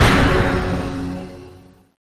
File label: shock